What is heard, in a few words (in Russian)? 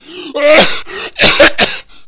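кашель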